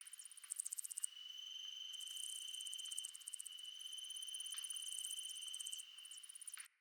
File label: Critter Loop, Ambience, Glades; Glades Of Andurin; Critter Loops